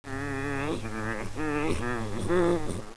zombie idle; xash3d fwgs

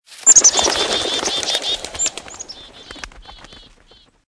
birds startled; amb; mohaa

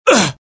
death, pain; mohaa; dialogue

